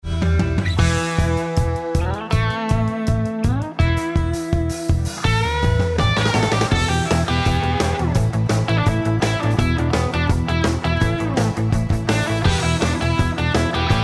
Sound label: Mix Plus karacterised Bass bypassed